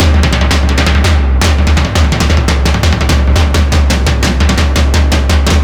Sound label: drums; Left 4 Dead 2; zombat; horde